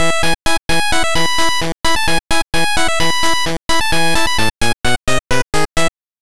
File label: jingle classic vivaldi spring